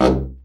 BRA Bari, SAX; Best Service Gigapack 1; BR SAX 2

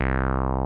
TB303; Zero G; Man Machine

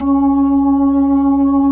B-3, Jazz

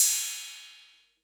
808 Cymbal; Concrete Collage Drum Kit; Concrete-808

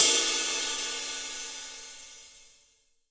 22INCH ZIL RIDE, Ride Cymbal

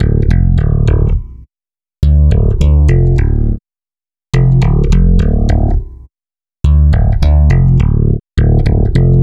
Stick Loop, Stick Bass

